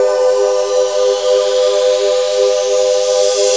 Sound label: Pad, Jungle; Pads; Blu Mar Ten; Jungle - 1989 to 1999